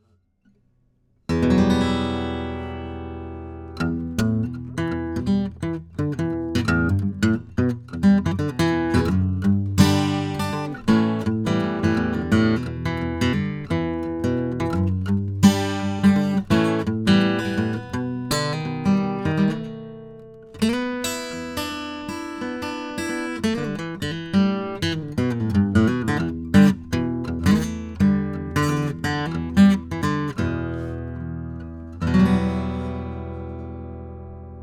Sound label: U87ai Acoustic